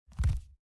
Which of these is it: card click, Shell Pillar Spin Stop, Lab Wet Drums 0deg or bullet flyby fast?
card click